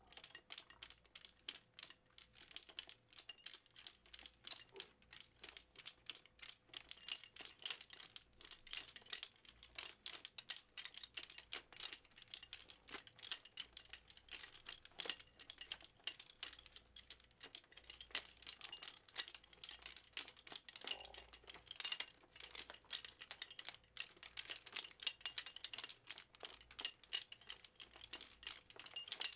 Horse Bridle

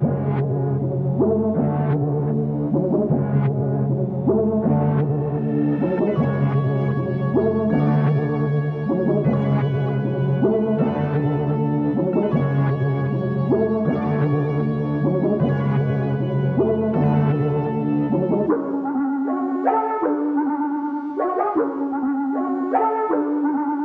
156 BPM F# Min; Melody; Tunnel Vision; Cymatics; Cymatics - 2022 Melody USB Expansion

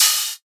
OH, openHiHats; Drums & Percs; Ghosthack

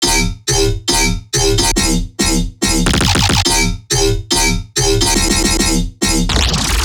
Riddim Bass Loop D (140); Bass Loops; Detest; Ghosthack; Riddim Essentials 2020

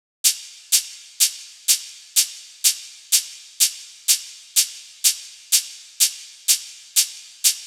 Top 125; Ghosthack; Drum Mega Bundle; Abstract Drums Volume 2

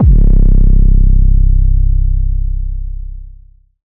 808 C; Advent Calendar - Day 9 - 808s

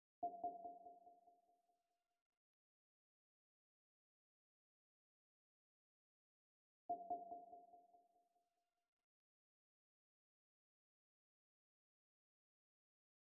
144 BPM F Min Pluck, Hip Hop; Melody Loops; Cymatics; Gracias

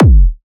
Techno, Kick; Techno Sounds 2024

